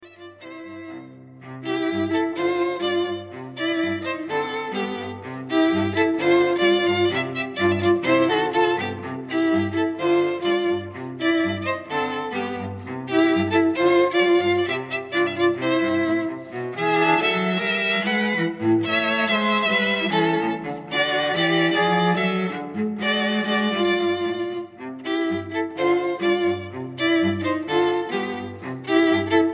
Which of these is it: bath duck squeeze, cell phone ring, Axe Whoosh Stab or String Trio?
String Trio